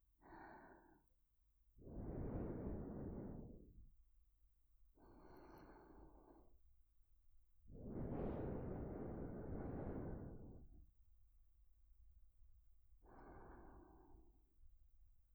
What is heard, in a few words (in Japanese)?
ループ寝息; 甘々でクーデレなお姉ちゃんといっしょ